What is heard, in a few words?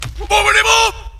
boom boom boom